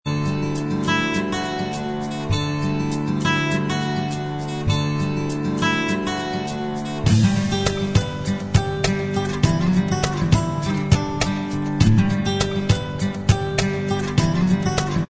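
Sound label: instrumental track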